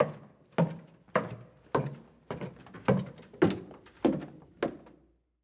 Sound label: Stairs Down Wood; Footsteps; HauntedBloodlines